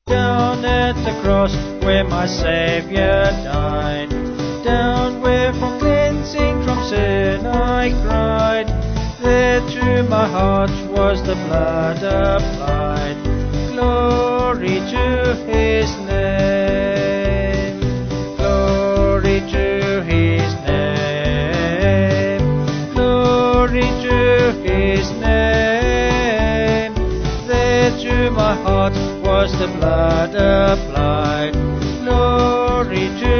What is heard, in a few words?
Vocals Band; Sung Lyrics